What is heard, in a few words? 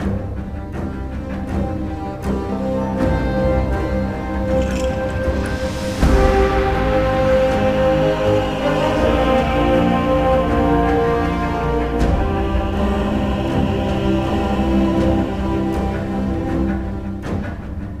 dialog victorious loop